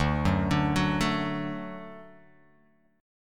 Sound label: D Major D down Guitar Open F; D arpeggiated; JGuitar